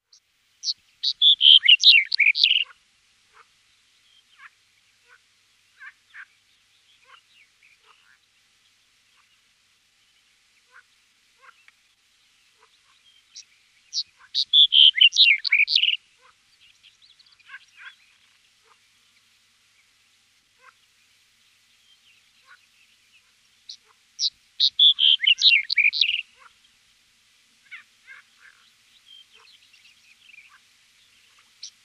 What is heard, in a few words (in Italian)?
STRILLOZZO; CANTI SAG NATURE 2024